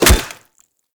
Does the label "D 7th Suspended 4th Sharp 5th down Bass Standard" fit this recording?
No